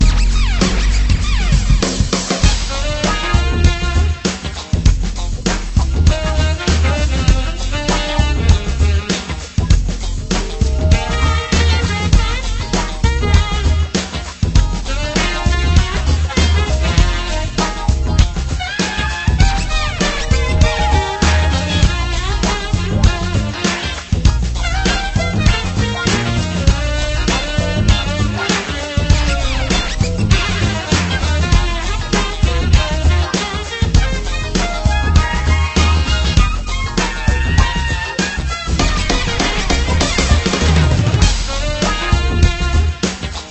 Menu Loop; tiger woods 99 pga tour golf ps1 windows gamerip 1998

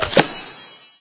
Reward claimed Sfx

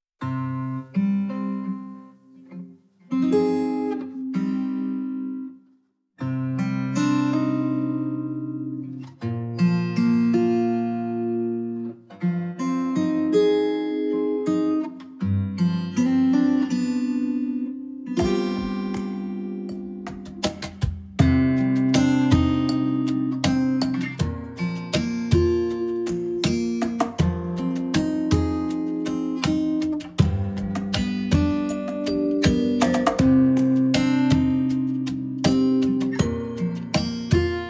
Acoustic Ver. (Instrumental); Punishing Gray Raven

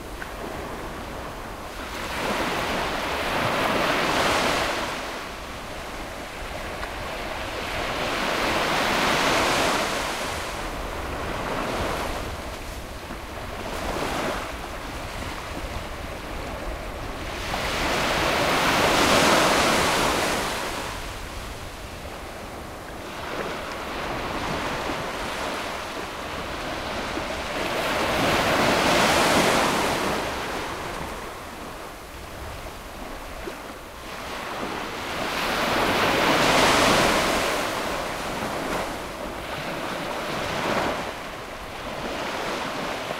Ocean Ambient; gamerip; dream daddy a dad dating simulator

